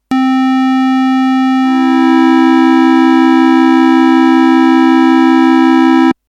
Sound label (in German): grosse terz ot; harmonie